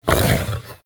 Melee Grunt; Enemies; Vocals; Warframe; TheNewWar; Archon; Amar

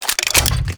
Arch Rocket Crossbow Un Equip; Warframe; Archwing

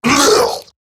Death Eximus Grineer Male; Battle Barks

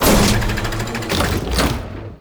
Dropship Open Door; Makvoss Cannon

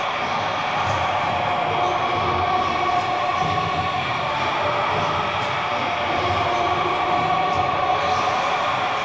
Spear Gun Buff Aura Loop; Hoplite

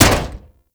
Staff Impact Armour; Warframe; Weapons; Impacts

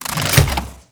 Arch Gun Reload; Thano Tech

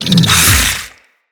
Infested Big Ashen Melee Swing A, enemies

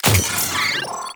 Drone Death Malfunction

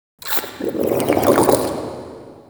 Interior Gurgle Slurps, Infested, Ambience; Misc Indoor

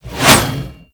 Scythe Whoosh; Warframe; Weapons; Stalker Scythe

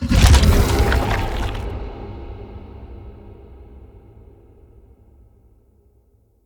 Root Spike Impact; Powers; Bromius

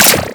Capture Flag; Warframe; PVP; Ambience

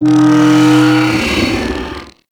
Step Movement; Enemies; Steps; Warframe; Corpus; Arachnoid Camper

